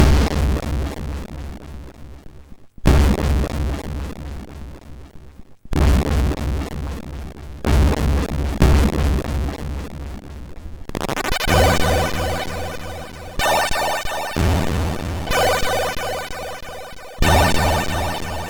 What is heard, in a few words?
Ping Ice sid MOS6581R4